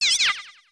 wiggler hit

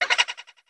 boo with cage dead

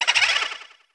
boo

